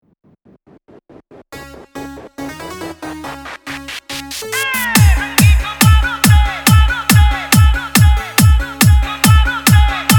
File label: Vibrat Mix